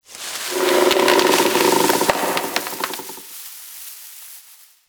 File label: Exterior Tree Wood Creaks Angry; Warframe; Duviri; Ambience